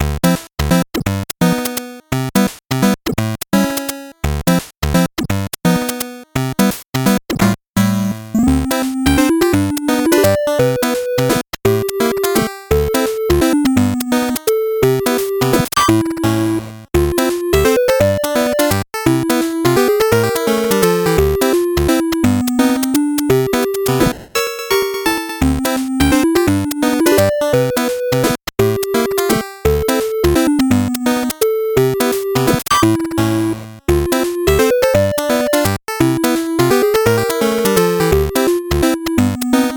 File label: 8bit bgm slow